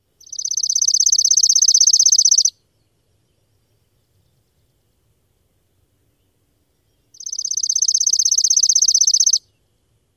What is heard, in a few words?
fast-pulsed song